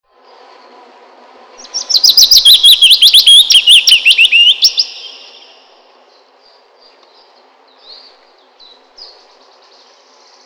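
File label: bird voices, willow warbler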